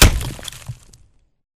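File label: Bullet Light Ballistic Dirt impact; Titanfall 2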